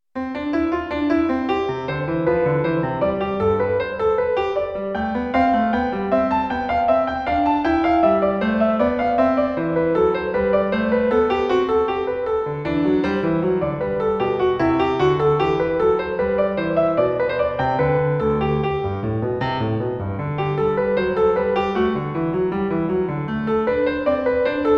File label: piano; J.S.Bach; invention No.1